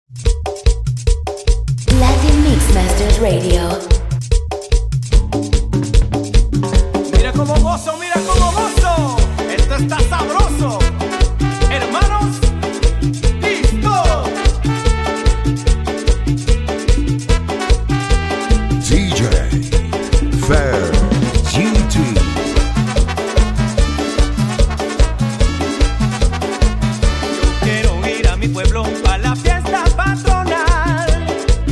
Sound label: MARIMBA MIX